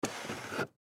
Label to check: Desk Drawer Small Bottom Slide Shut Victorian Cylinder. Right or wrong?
Right